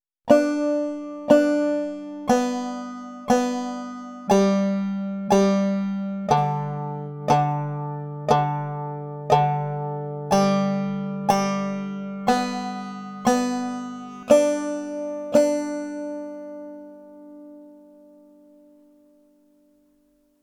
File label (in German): zeigefinger saitenklettern